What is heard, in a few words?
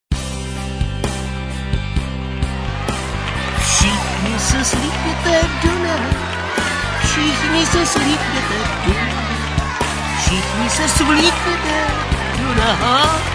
slow